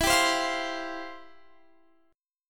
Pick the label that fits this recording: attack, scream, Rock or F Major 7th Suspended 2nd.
F Major 7th Suspended 2nd